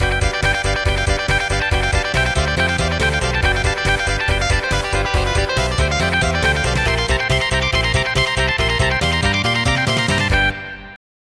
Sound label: Level Jingle; lem3d